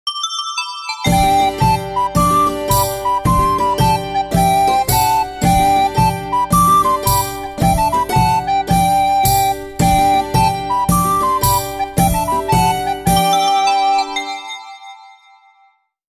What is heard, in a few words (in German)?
Medieval Christmas, klingelton